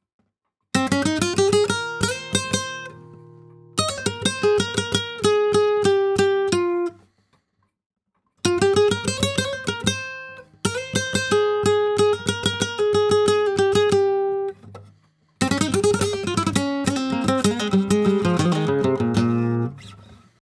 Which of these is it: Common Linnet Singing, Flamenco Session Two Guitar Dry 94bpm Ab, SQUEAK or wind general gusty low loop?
Flamenco Session Two Guitar Dry 94bpm Ab